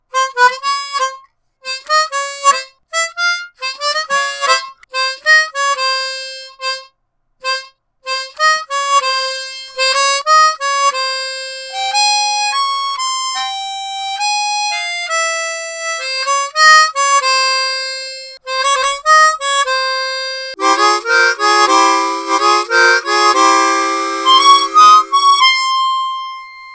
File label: Harmo Chroma Tango